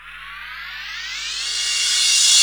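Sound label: crash reverse, Dance Mega Drums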